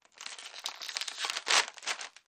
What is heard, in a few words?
Potato Chip Bag Openi